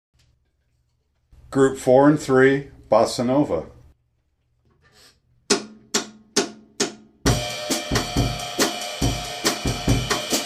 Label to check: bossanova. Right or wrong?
Right